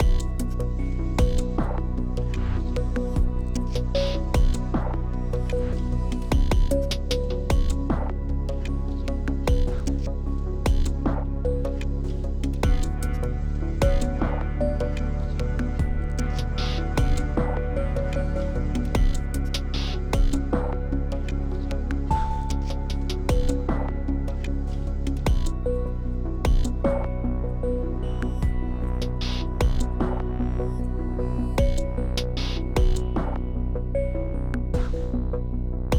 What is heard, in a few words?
Exploration 8CH 76BPM